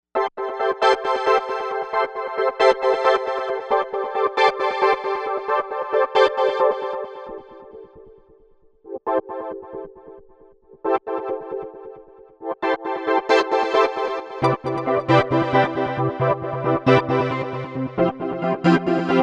Organate, Novation UltraNova; Factory Patches

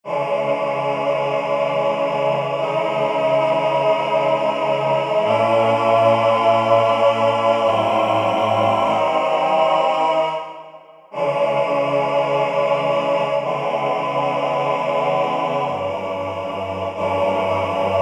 Male Choir; synthesizer; Guess the synthesizer game

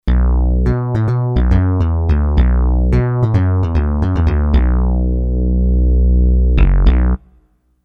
Mix Bass, Kurzweil K2500X; Factory Programs

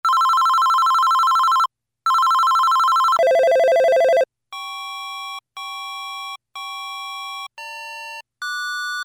Telephone, Korg MS2000; Factory Patches

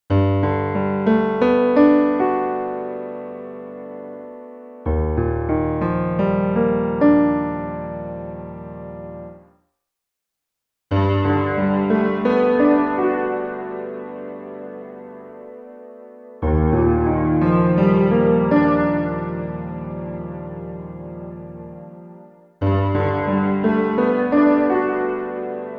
SWEPT REVERB

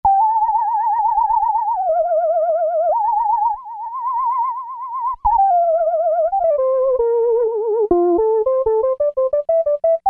Vib Sine; Roland SRX-05 Supreme Dance

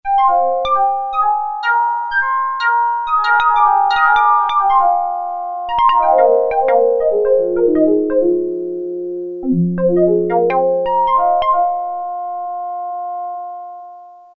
Percussive Flute With Delay, Prophet-5; Sequential Circuits Prophet-5 (Ver.3); Factory Patch; File 2 Programs

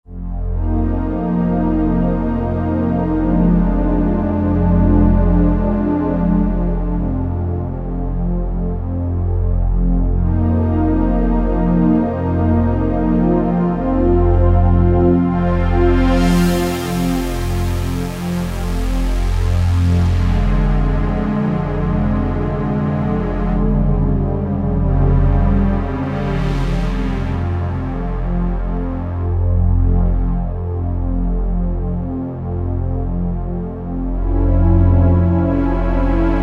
Pulse Pad, Roland JD-990; Factory Patches

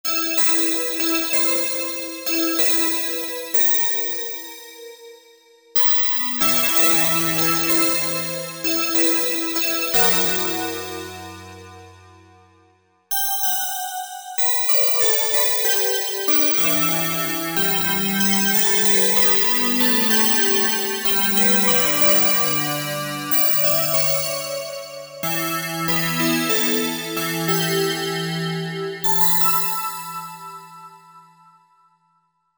SYNTH BASICS Space Harpsichord; Apple GarageBand 2

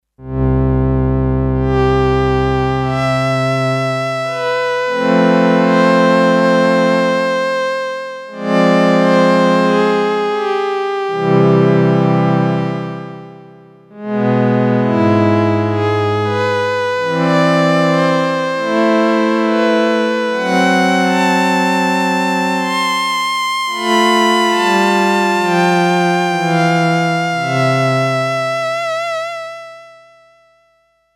String 1, Sequential Circuits Six-Trak; Factory Programs

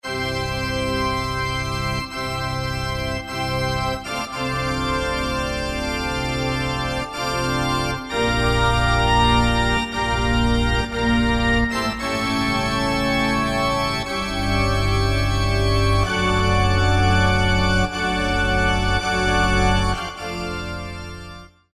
Pipe Organ, Roland JD-990; Factory Patches

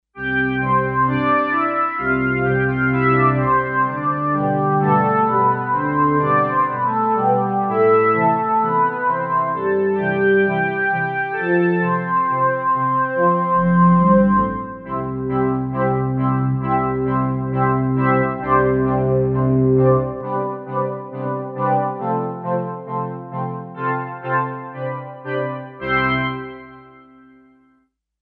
Chamber, Kawai K5000S

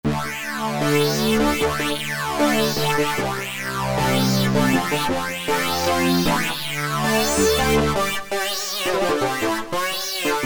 Fuzz, Korg MS2000; Factory Patches

